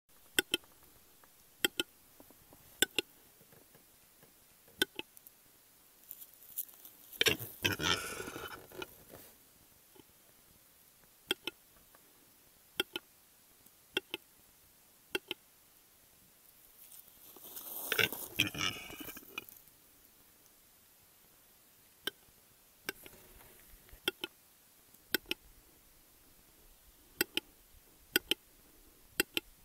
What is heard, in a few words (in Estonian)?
Tetrao urogallus Metsis.